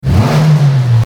car engine rev

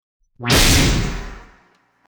plasma blast

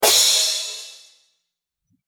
cymbal crash

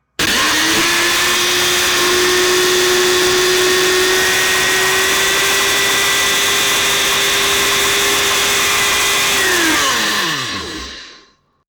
Blender

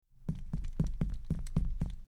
Footsteps running

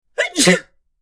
Sneeze woman

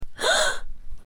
Gasp Woman

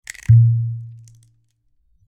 Cork pop

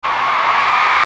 Crowd ambience concert

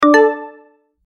Notification ping

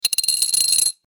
coin spin

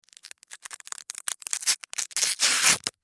Velcro rip

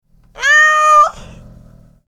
cat meow

